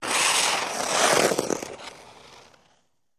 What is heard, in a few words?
Ice Skating Swerves